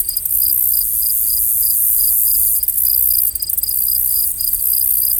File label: Desert Cicada Loop; moul-assets